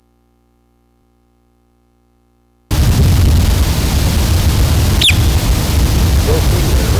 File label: hybrid, crows